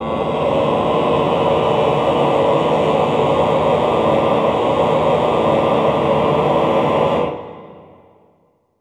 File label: Cluster male, Classical Choir; Best Service; Extended Classical Choir